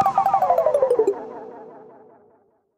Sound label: Game Outro; My Tamagotchi Forever; android; GSFX 2048 Game Outro